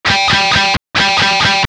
Gtr; pitchshifter PSI

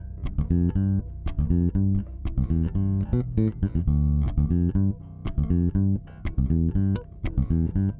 Electric Bass; Basses

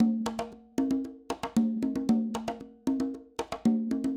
Acoustic Percussion

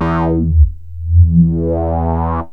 bass, yamaha rm1x synth bass and lead

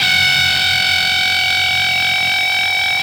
Processed Guitar, Loops; Guitars

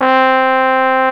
C3 TRMPET; roland1

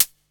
HIHAT; SoundFactory; SMPMANIA

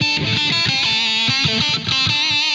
Electric Guitar, Loops; Guitars

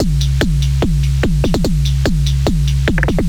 Dance Drumloop; Drums; Dance Beats

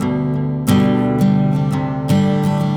Acoustic Guitar; Guitars

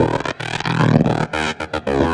Synth Bass; Basses

